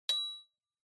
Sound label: Inspiration